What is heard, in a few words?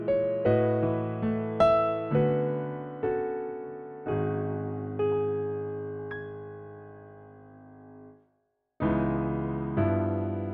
quartet Abm No Flute